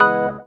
DR. LOOP; X-Static Goldmine 3; CHORDS

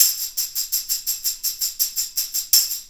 Tambourine

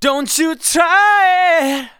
vocals sung F#; Techno Trance Essentials

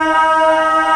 MALE AH D#3, SOLO, Africa; Spectrasonics; Heart of Africa 1

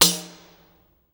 TAMBOURI.HIT; Best Service; World Colours

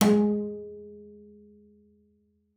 G#3, bass pizz; Xsample VOL-5

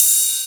Rides, Cymbals

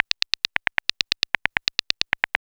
SYN FX; X-Static Goldmine 1

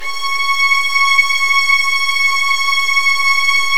C#3, Virtuoso Strings

